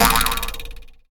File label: boing